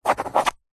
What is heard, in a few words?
office pencil signing signature on back of pad of paper